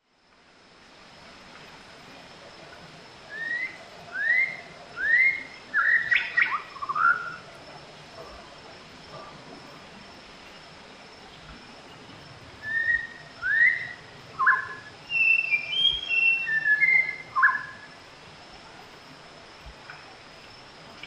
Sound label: white rumped shama